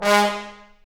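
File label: Trombone Section, Brass Sections; Roland LCDP06 Brass Sections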